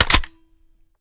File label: raise first chamber, reloads; gmod; iw8